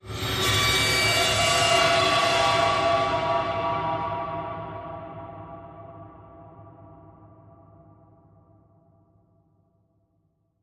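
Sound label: Creature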